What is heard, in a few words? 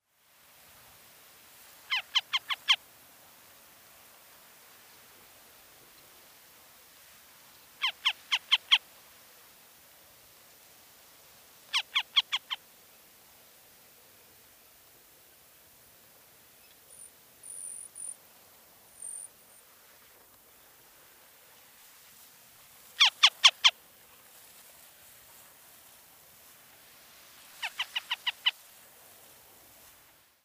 Circus hudsonius; roofvogels; Accipitrinae; Amerikaanse blauwe kiekendief; Accipitres; Accipitidae